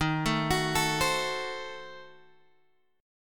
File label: D sharp Diminished 7th D sharp Guitar Open F; arpeggiated